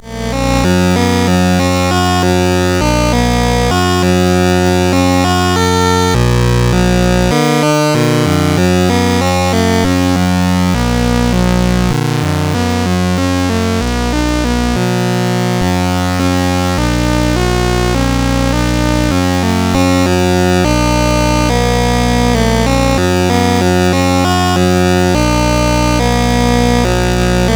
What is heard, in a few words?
sid CSG8580R5; Dungeons of Doom